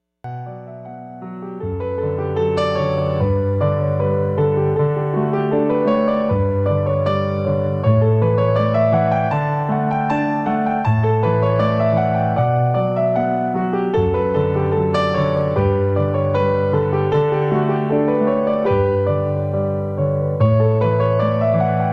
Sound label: piano